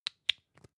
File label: lipstick close soft; haus-garten; badezimmer